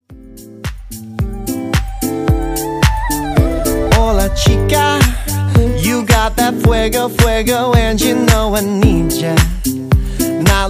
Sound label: redrum 110 bpm